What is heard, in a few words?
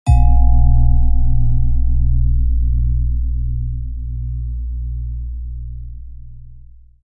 Bell Chime Lfe Reverb